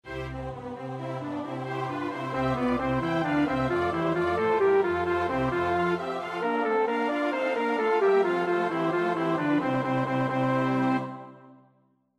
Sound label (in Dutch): bas, koorpartij oefening; Purcell (Henry); Dido and Aeneas